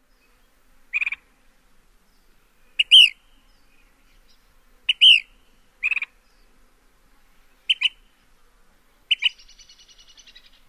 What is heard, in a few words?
calls